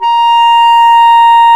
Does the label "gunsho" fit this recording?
No